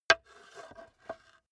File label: metal scrape on wood impact